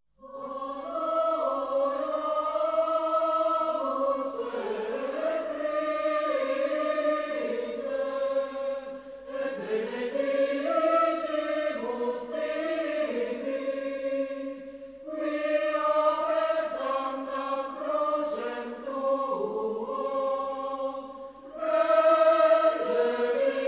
Momenti of Gregorian polyphony for chorus N. 3 Adoramus Te Christe Largo espressivo